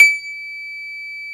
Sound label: Piano C5